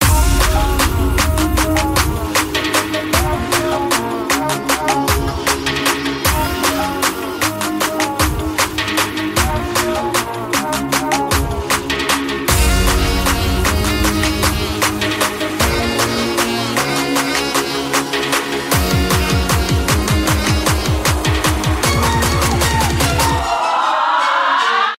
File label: instrumental